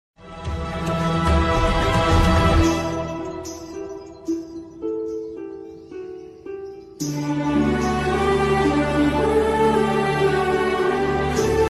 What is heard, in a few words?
sad instrumental music